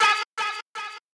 vox

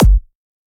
Dr Kick

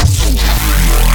custom bass

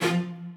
strings

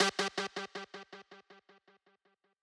lead

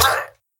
death clean, mob villager; Minecraft

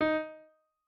piano

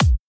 kick

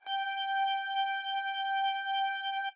lead

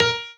piano melo

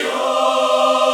choir

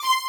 violin

